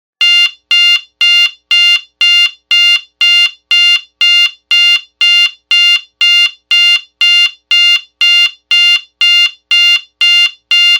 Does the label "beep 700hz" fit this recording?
Yes